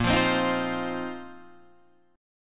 A sharp Suspended 2nd Suspended 4th down Guitar Open D